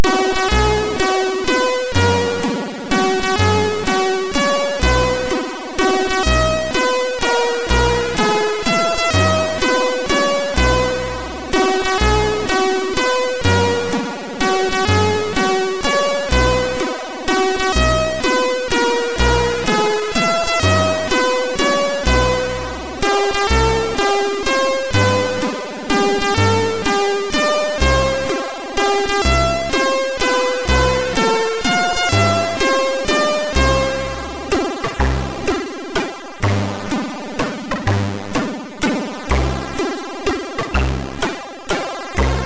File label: Happy Birthday, sid MOS6581R3; hvsc